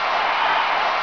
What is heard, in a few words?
engine; AssaultCube; Alien Stars; American Muscle Series